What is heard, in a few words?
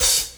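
clicky open high hat sound d key, tuned to the D note; Duration 0:01; Samplerate 44100 Hz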